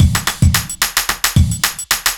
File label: block rock kit